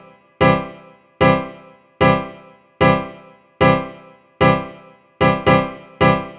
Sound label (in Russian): Room Grand, сэмпл клавишных регги